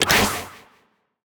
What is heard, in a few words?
Loop Fire; portal quest; android; Rebel Skill1